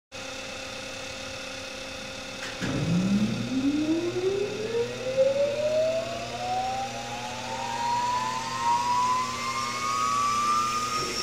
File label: Jet Engine High Pitch Start